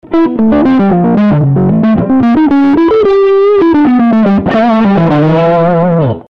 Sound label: Deep Lazy